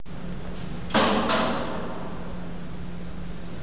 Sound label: nautical; GRSITES Graphics